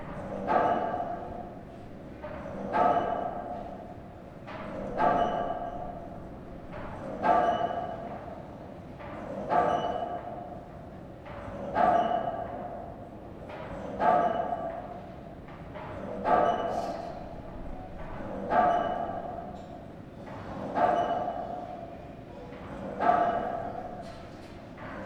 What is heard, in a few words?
FACTORY MACHINE